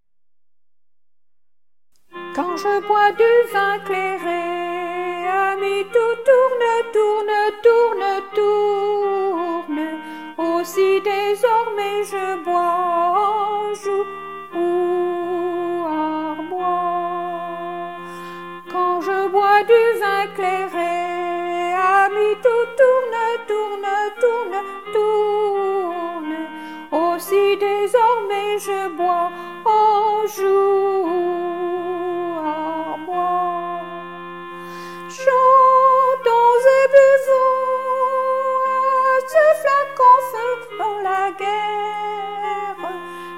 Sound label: Tourdion Slow Sop